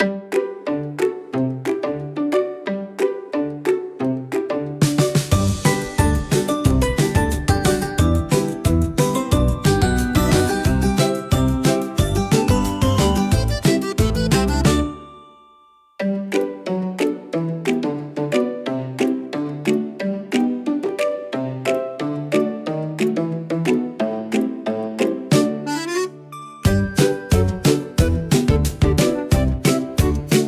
Marimba Mischief, comical marimba for funny scenes